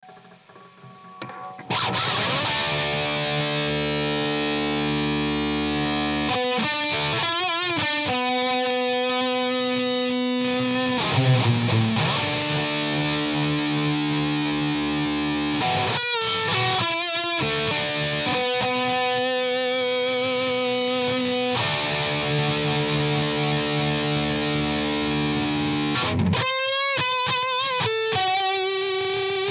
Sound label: lead